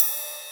Ride Ping

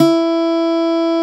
Steel Ac Gtr G2

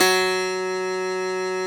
Piano A0

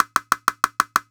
Quinto Closed Slap

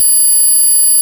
Triangle Wave Db5

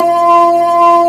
B3 Lo Dist Slow D#3

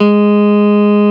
Jazz Guitar C2

